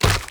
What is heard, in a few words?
Proto Infested Runner Step Default; Warframe; Steps